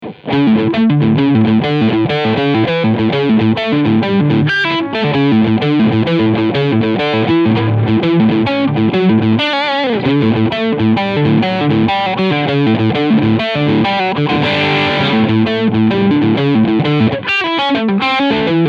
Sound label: Fender Player Plus Tele Leadsound Steg PU